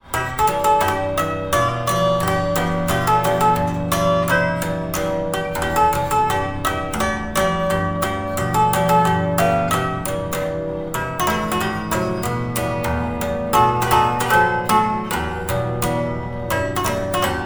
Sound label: Chordephon Wir Tanzen Ringelrehn; Kalman; geluidenquiz